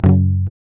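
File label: GUI F#, acoustic guitar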